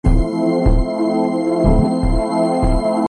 melody

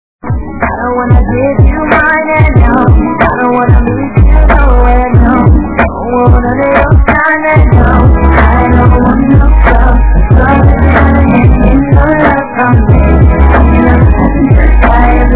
scrub; Tokyo Spa

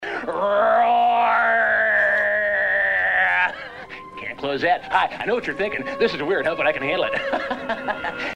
roaaaar; TEXAS CHAINSAW MASSACRE II

